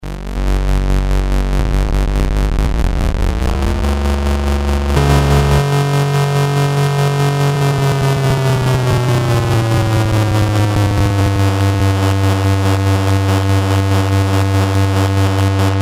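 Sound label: test detune, analog polysynth; Solton SM100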